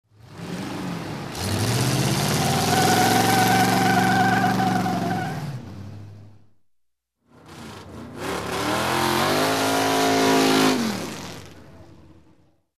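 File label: sharp car start tire squeal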